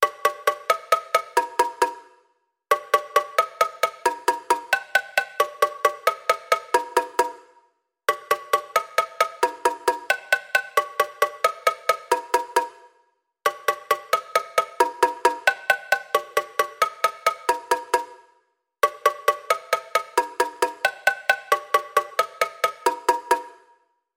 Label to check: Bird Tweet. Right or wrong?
Wrong